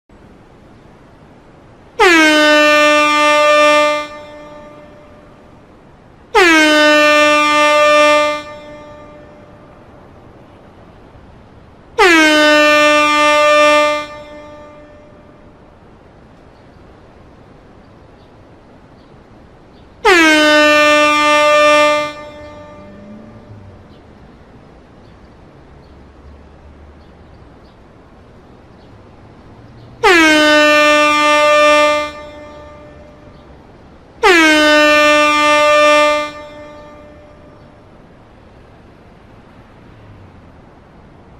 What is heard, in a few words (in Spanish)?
Bocina de aire - Efecto de sonido